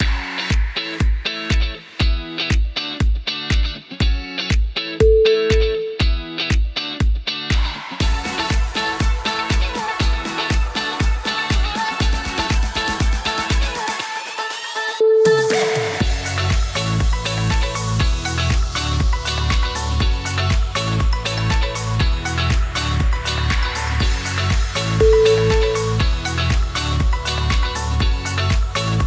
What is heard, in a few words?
summer upbeat pop